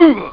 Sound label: GRUNT